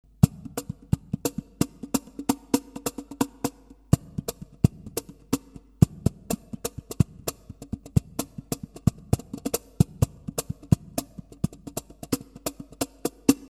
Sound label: practice